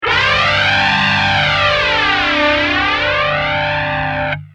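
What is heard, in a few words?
guitar Fender flanger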